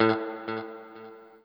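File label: NOODLE SHORT, Guitar & Bass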